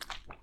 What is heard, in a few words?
Wet footstep; Phasmophobia